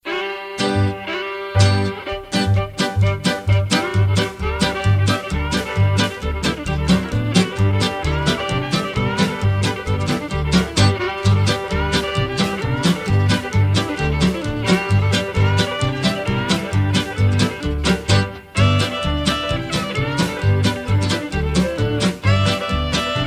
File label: music sample